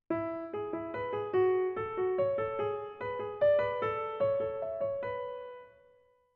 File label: motives; 09 - A Major 3/8